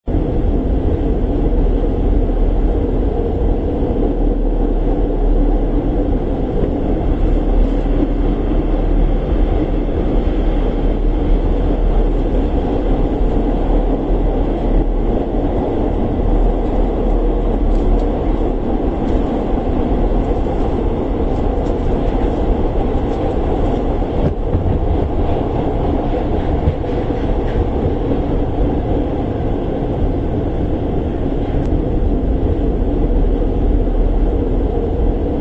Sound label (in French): Train à l'interieur